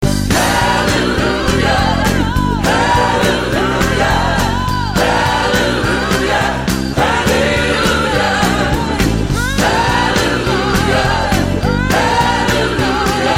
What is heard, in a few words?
Hallelujah Gospel